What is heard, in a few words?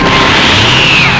scream; valve; gohan